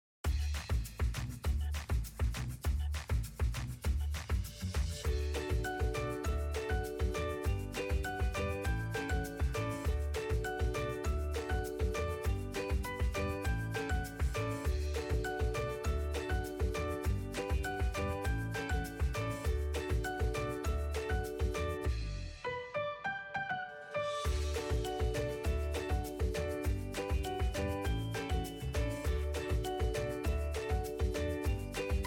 childrens music happy upbeat music instrumental music for kids